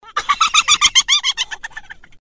Tickled Chipmunk

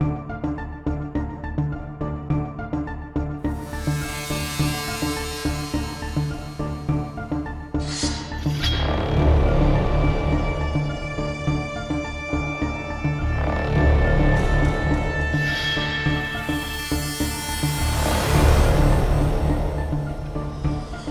Epic Hybrid Trailer

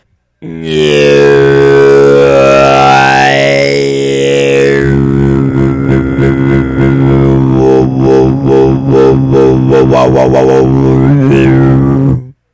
Dubstep Wub Made With Only My Voice

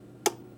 Click on

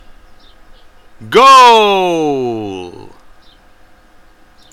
Goal

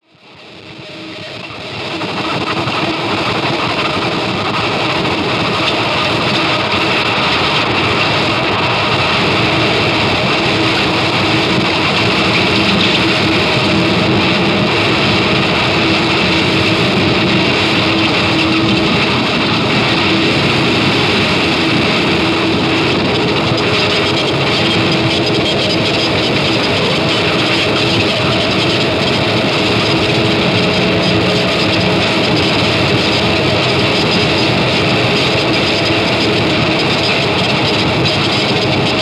Noise guitar 140

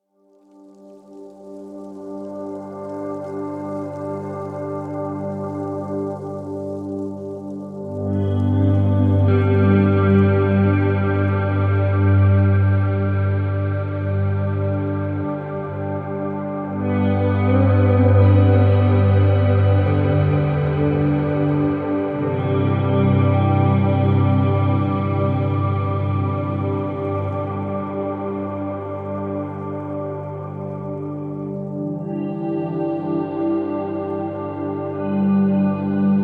Ambient Meditation Guitar

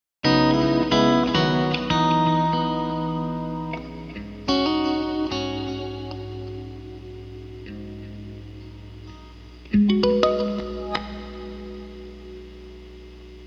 Chordal Meandering

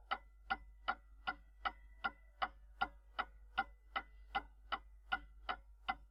Old Clock Ticking

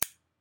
Scissors

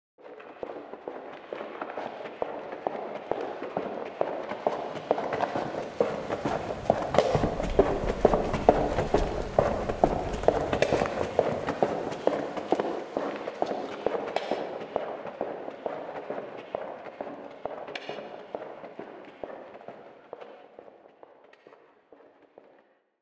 Footsteps Left To Right

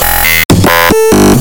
Mutant

